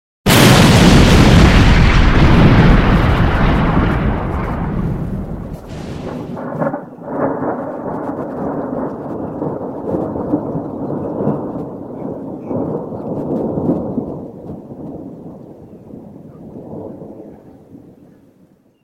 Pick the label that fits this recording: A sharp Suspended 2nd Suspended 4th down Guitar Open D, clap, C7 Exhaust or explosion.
explosion